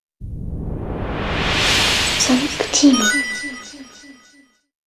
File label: JINGLE; Sonic Team 1996